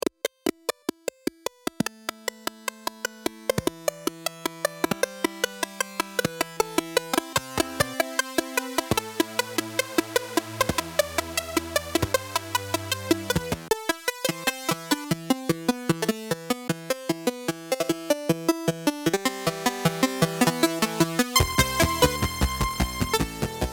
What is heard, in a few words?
UltraSaw Modular